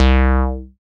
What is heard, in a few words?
SYN TB SUB, Roland TB-303; Sound & Vision - Gigapack I CD 2